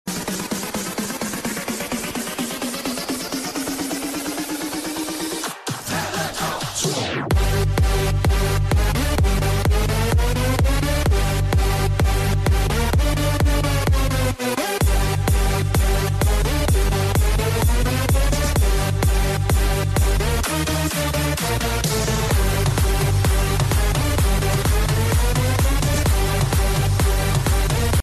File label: best beat drop